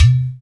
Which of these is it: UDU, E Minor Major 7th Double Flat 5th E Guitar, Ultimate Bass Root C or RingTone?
UDU